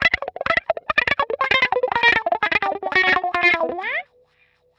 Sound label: wah grind; PowerFX - Nu Jazz House